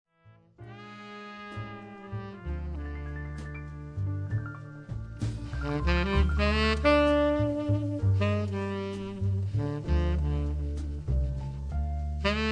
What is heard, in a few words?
Solo